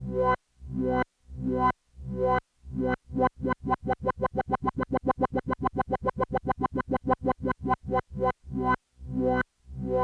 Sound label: Leslie